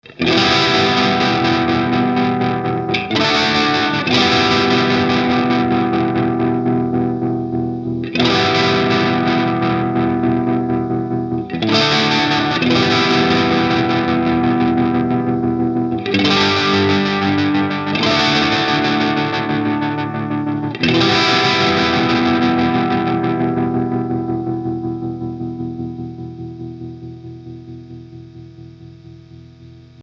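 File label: Ernie Ball Expression Tremolo slow fall crunchy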